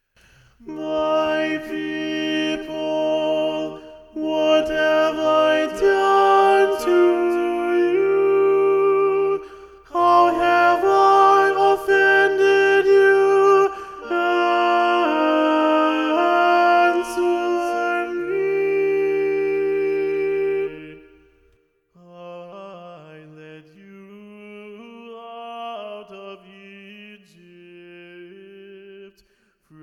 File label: The Reproaches Part 1 SATB Alto Predominant, Rehearsal Tracks; Tomas Luis de Victoria; ed. Geoffrey Cox